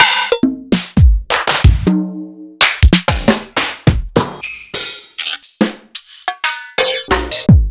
EMU Proteus-2000

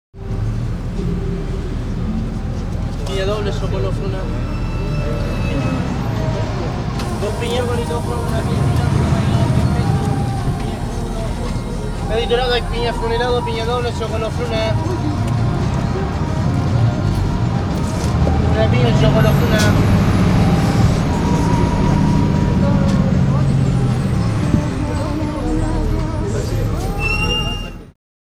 Salsa Picante; Masterbits; World Zone VOL-3

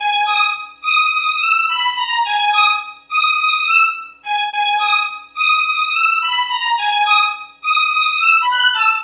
Synthetic Strings; Sonic Foundry (Sony Creative Software); Club Joints and Street Anthems; Lions Den

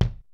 KICK SNARE; Kid Nepro; Elite; KICKS SNARES

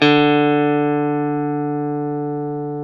Classic Guitars; E-MU Formula 4000 Series Vol. 3 – Analog Odyssey

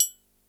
triangle, Yamaha RY-8

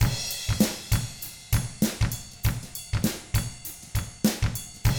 FUNKY, Drums

